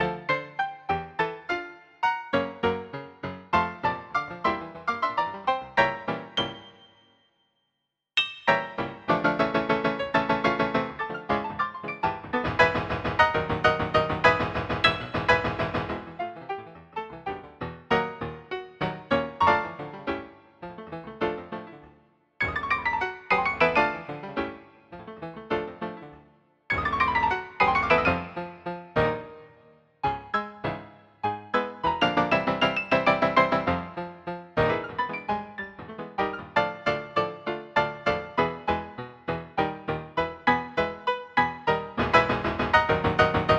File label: Death Waltz salty